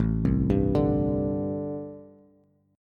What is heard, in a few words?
A Minor 11th A Bass Standard, Chord; Am11 arpeggiated; JGuitar